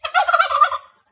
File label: Turkey Man Scream